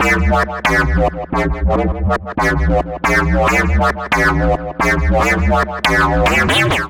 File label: D Minor 139 bpm, Bassline; MIDI Clips; Tonal; Cyclon; Skitter and Step; Ableton Factory Packs